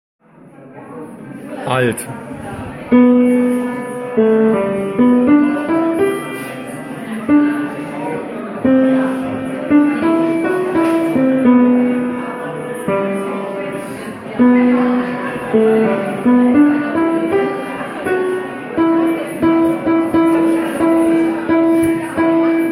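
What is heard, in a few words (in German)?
Glory halleluja alt, gospel choir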